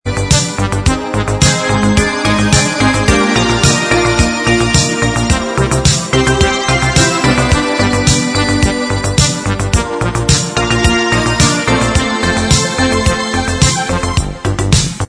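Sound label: instrumental Track, backing tracks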